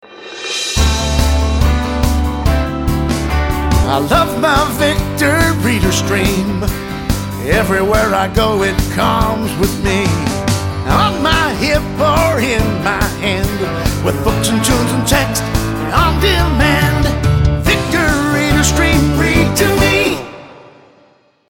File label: Ring Tone; VR Stream